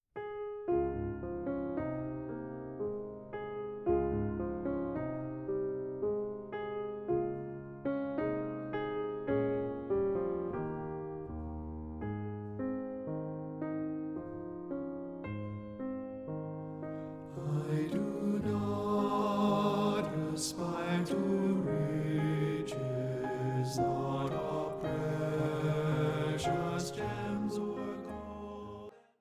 A Pure Heart TBB (Baritone Dominant)

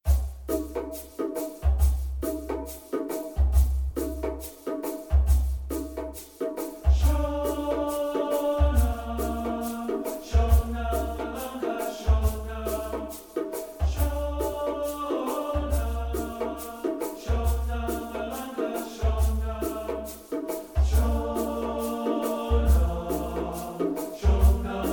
Shona Malanga TTB (Baritone Dominant)

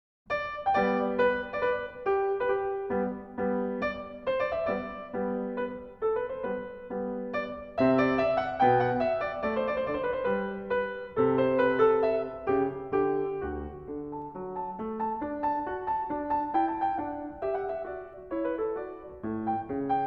Sonatina In G Major, H. XVI, No. 8 I. Allegro

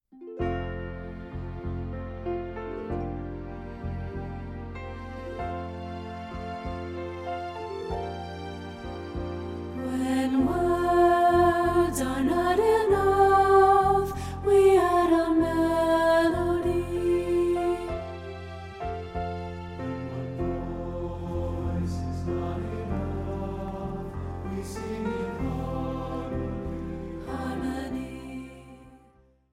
When Words Are Not Enough SATB (Alto Dominant)

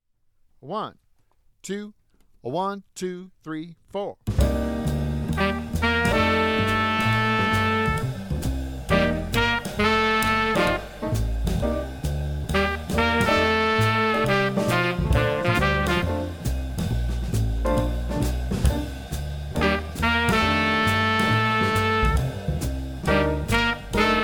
Improvisation, Piano; Improvisation 101

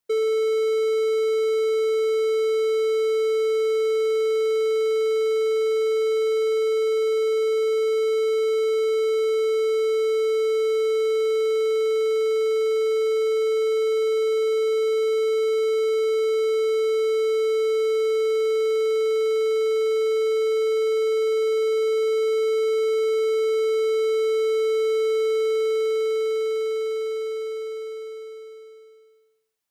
Tuning Tone

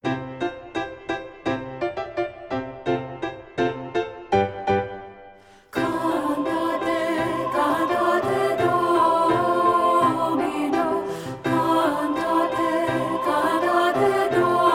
A Classical Cantate SATB (Alto Dominant)

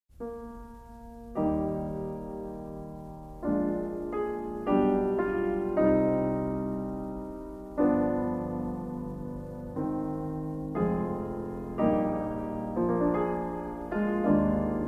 Largo in Eb major, piano

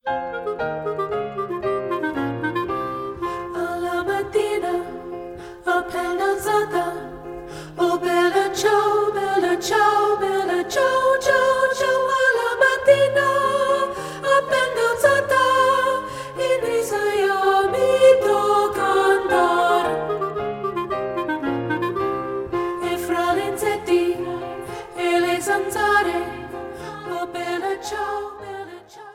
SSA (Alto Dominant); Bella Ciao

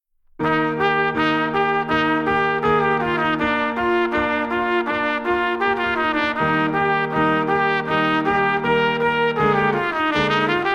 Brass Line A Solo with Piano; The Rakes of Mallow

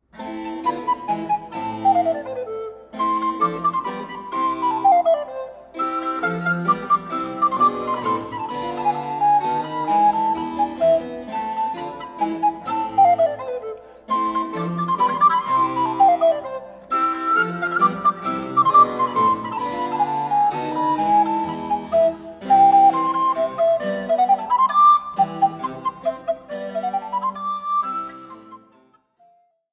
Orchestral Suite - IVb. Menuet II; TWV 55 A2

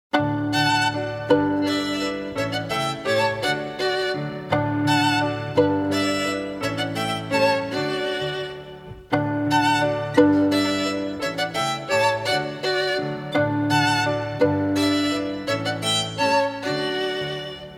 Waltz (arr. for violin and piano)

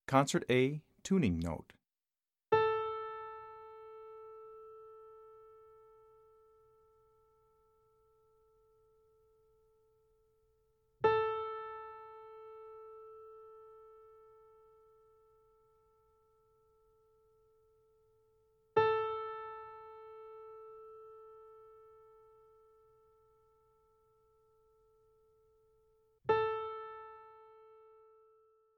Tuning

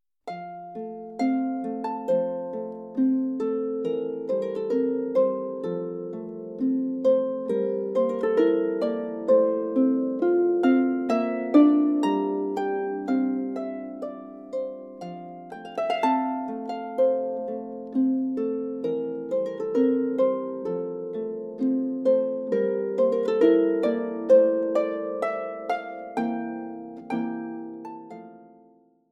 Harp Sonatina; No. 2 in F Major

